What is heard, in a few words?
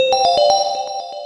Cute Sound